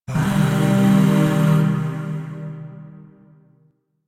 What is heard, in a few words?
Shawzin Min Chord A; Music; Chords; Warframe; Zariman Void Shawzin; DE